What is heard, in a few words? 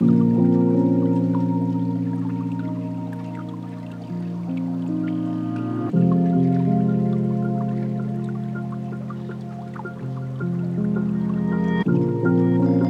lofi hip hop